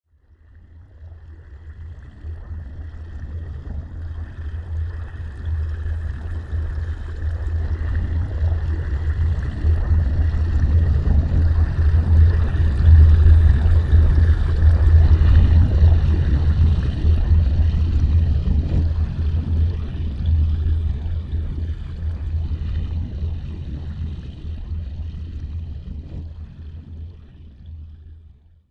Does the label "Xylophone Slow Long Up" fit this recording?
No